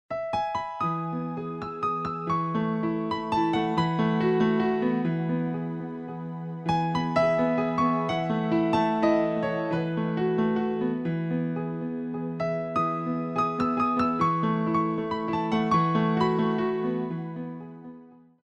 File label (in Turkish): santral fon muzikleri